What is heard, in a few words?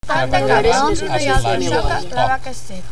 Four Voices mix